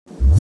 144 BPM Reverse Kick; Vengeance - Essential Clubsounds Vol.5; Flight Kit Root Amin

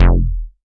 bass F1, Roland MKS-80

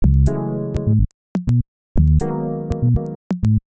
house drop; Polyend; Loops n trouble

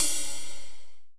HH, Dance Mega Drums; Best Service; HH-HIP

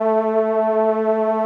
SOLINA, String Machines

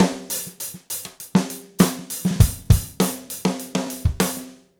Gretsch groove 90 BPM, 1967 Gretsch Kit drums; Sonic Foundry (Sony Creative Software); Vital Drums

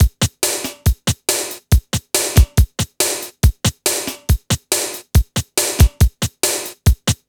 techno; INIS

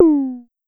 Tom Hi Mid, Pollard Syndrum

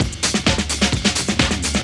groove, DRUMLOOPS; Techno Trance; Ueberschall; Techno Trance Essentials

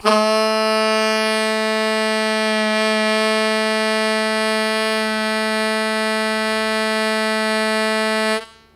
Loud Attack Sustain A0, Harmonica; Zero G - Harmonica

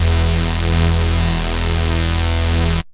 lead synth, Euro E1; Best Service Alpha Dance vol.2

